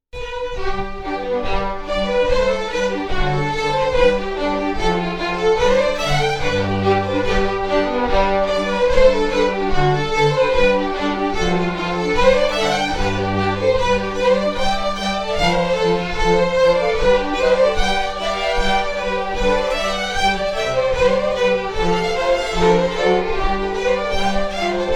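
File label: Reel, Scottish Fiddling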